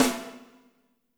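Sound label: piccolo snare; Spectrasonics; Burning Grooves CD2; PICC. BALLAD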